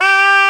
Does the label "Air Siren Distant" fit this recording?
No